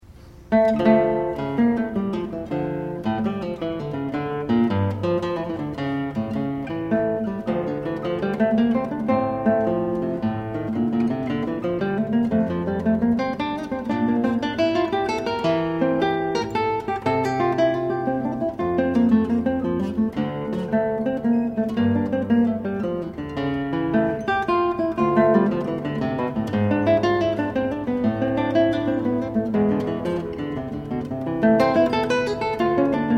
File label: Classical Guitar; Johann Sebastian Bach